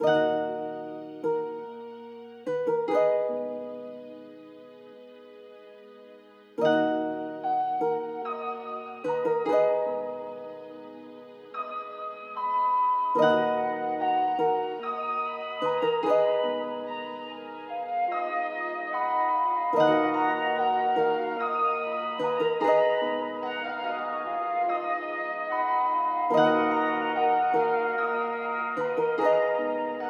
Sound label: 146bpm